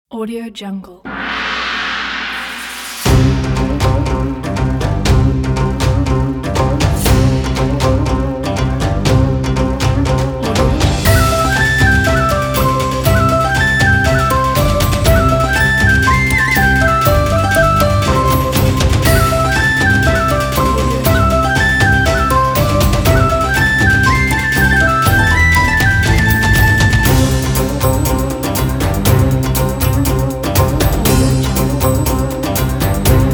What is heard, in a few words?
chinese festival